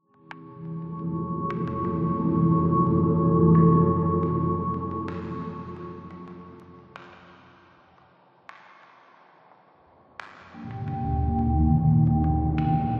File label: Normal Factory Ambience